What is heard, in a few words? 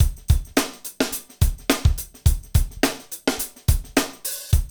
Hip Hop, Drumscapes Roland; Northstar